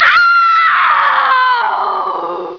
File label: death; mk2